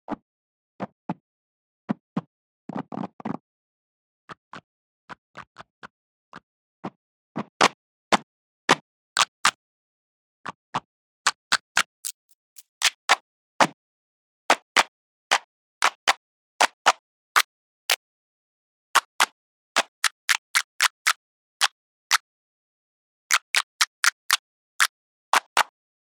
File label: Snaps N Claps